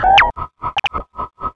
mine seek loop, roller; hl2

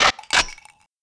shotgun cock; weapons

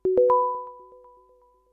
success, glass; sourceforts1941; xenon

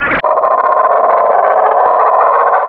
roller mine taunt; hl2

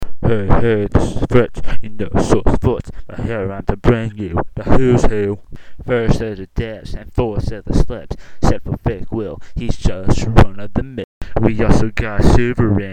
Rap; SourceForts

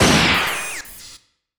old fire, crossbow; weapons; Sourceforts2.0

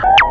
roller mine blip; hl2

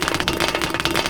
mine move fast loop, roller; hl2

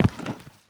concrete, footsteps; Sourceforts2.0

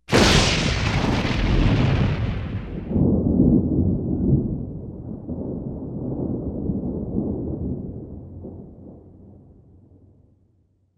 thunder; Sourceforts2.0; rainthunder

